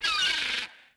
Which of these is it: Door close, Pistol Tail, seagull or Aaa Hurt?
seagull